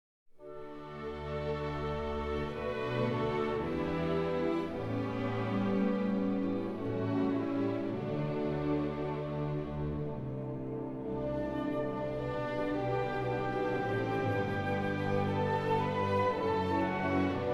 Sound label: czech, national anthem